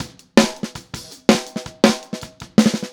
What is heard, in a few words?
Snare, 162 BPM; Verse; Drums